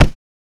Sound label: Short foley